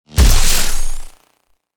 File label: EMP Shockwave glitchy electric